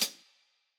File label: hihat, lofi hiphop; Legendary lofi hiphop